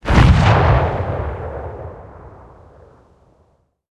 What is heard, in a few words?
cannon 37mm sh37 tail far; guns; tanks; War Thunder